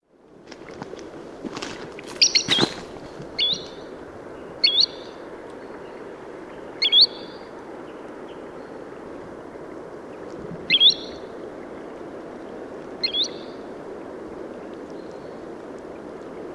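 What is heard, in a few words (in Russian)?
chernysh golos, green sandpiper voice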